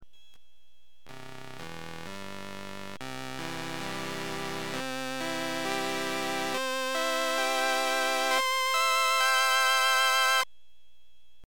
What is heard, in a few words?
String, Rheem organ